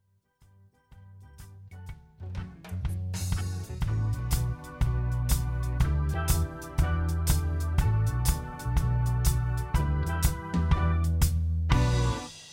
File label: Reggae